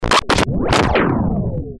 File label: Lo-Fi SSL Scrape